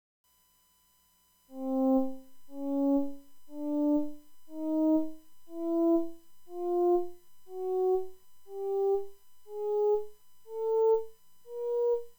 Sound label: bowed gauss; PIC32; Sound synth